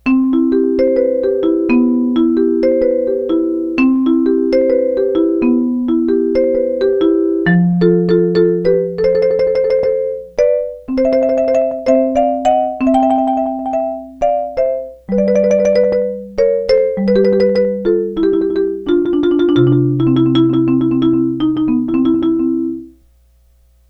MARIMBA

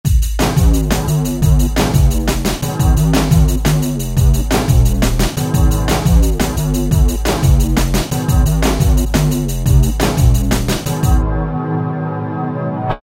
Boosted Jazz, Factory Patterns; Korg ES-1

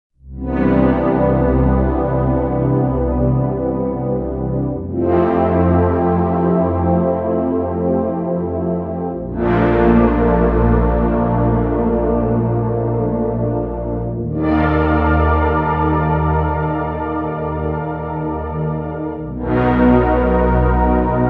Mellow Pad; Digital Synthesizer

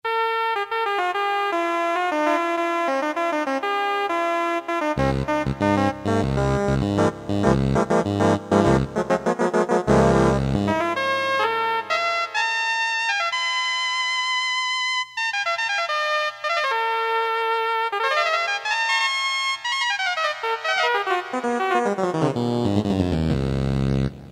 synth. reed, Casio MT-500; Tones

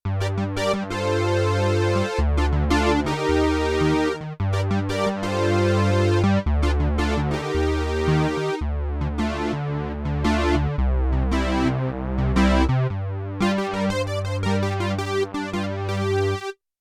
Dual Basic Prophet, Prophet 5; Yamaha TX16W

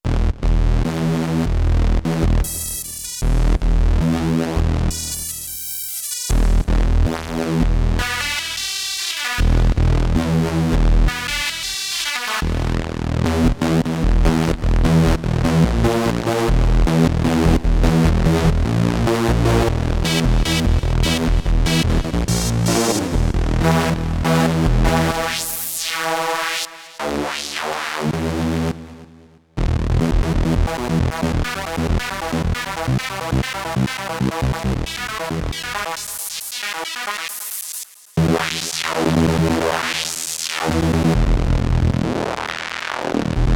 Bass Lead, Korg Radias-R

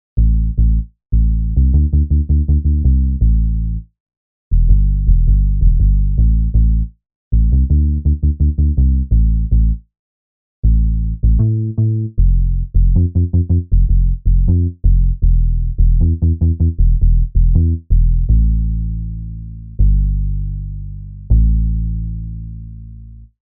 Rubba Bass

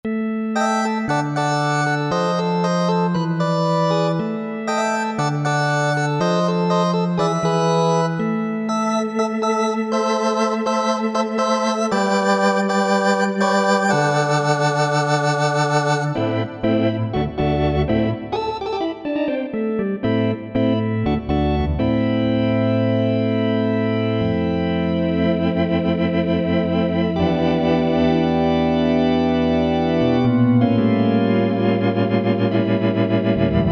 Psuedo Organ

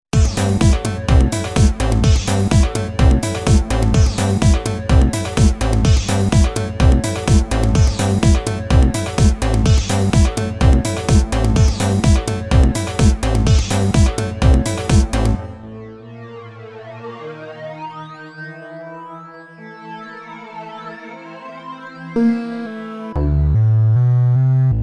House

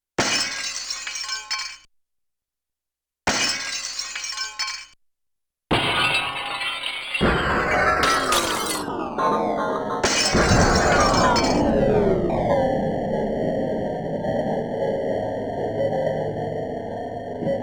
Broken Glass down; Yamaha TX16W; Sound FX

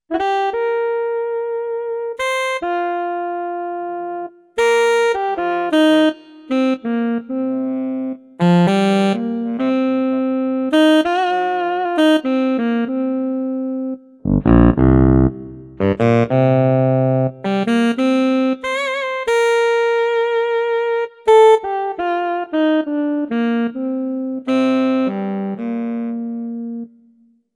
Alto Sax Growl

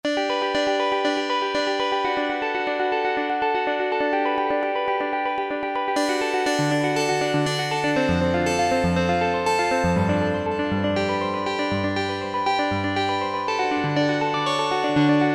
Arp, Roland JP-8000; Juno Arp; Factory Patches

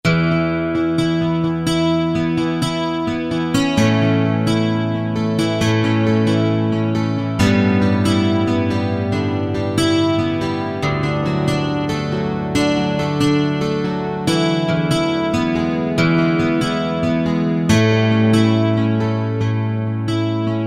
12 String; Factory Combi demos

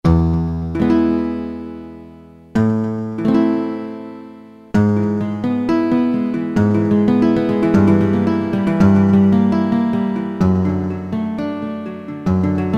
Nylon, Yamaha TG33; Factory Presets

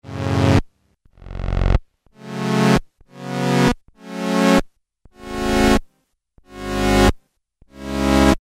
Black Hole, Korg Poly-800

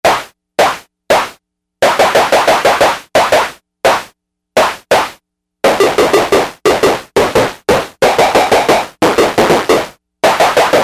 Snare, synth; Quay Snare

